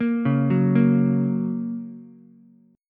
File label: A sharp Minor Sharp 5th down Ukulele, A#m#5 chord; arpeggiated; JGuitar